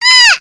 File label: pain severe; voice packs